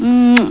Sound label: kiss warm